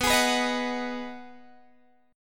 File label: B 6th B down Guitar Open G